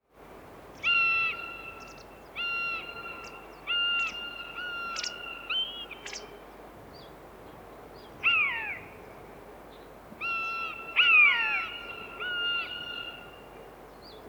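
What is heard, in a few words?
common buzzard voice; kanyuk golos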